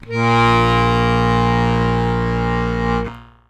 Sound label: BASS A#1; Best Service; World Colours